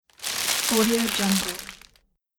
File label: squeezing paper